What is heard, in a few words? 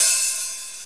hh open